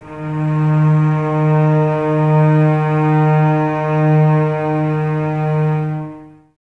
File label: Cellos D#3, String Ensembles, No Vib; Miroslav Vitous